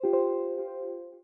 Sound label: Windows User Account Control